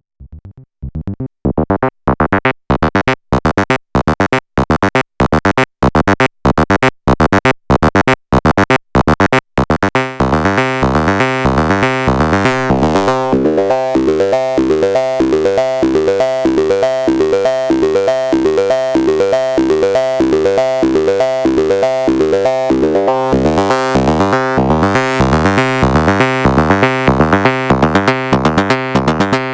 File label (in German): über 4 Arpeggio